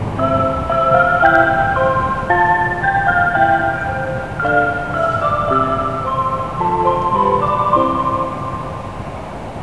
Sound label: chime; 「ひかり」終着チャイム